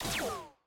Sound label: skill aah; shaco; skill 1